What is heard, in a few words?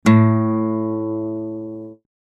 A String, guitar